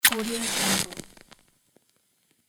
match strike; monochromeeffect

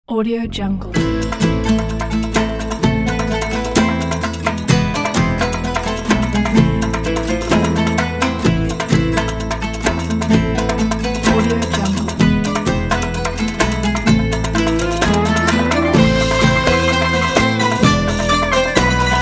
istanbul fantasy

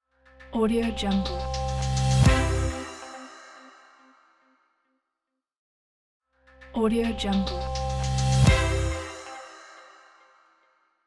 upbeat corporate logo

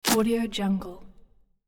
cardboard tear; hollywoodedge

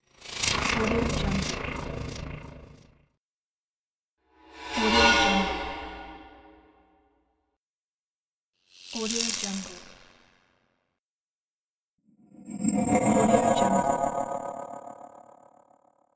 appear transitions; audioefx

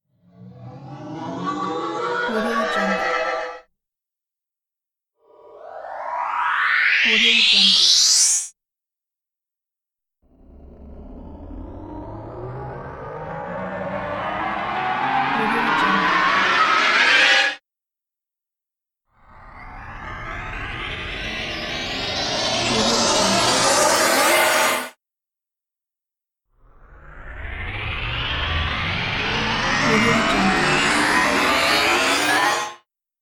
starship engine starting

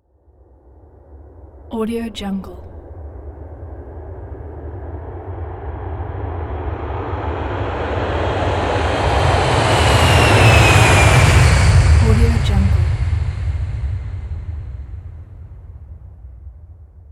jet takeoff

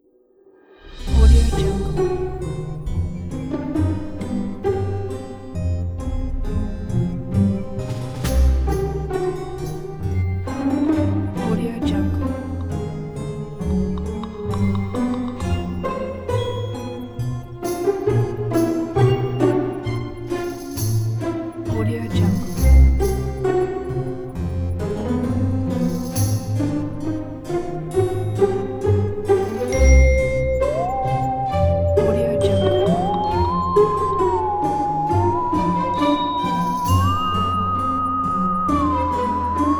detective halloween

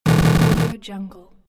error glitch

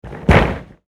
wrestling body slam

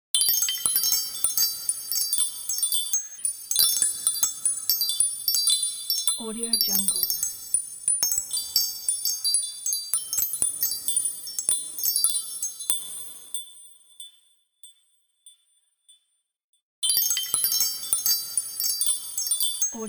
christmas magic chimes

